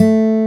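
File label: A 2 OVATION, acoustic guitar; AKAI S6000 CD-ROM - Volume 1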